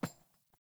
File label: Grunt Boot Walk Metal, Footsteps; Enemy; Melee; Sinecure; Boots